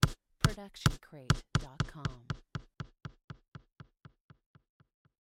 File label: basketball ball bounce to a stop